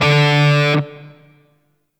Shawzin Oct Two D; Warframe; Grineer Shawzin

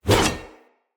Heavy Whoosh, Swords; Weapons; Mockery; Mockery Brazard

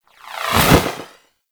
Dragon Pelt Idle Wing Flap

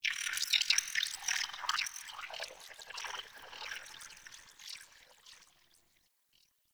tunnel critter; Warframe; Tau

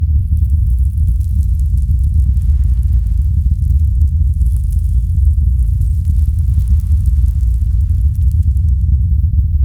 Golden Maw Burrow Loop LFE; Ambience; Gameplay; Warframe; The War Within

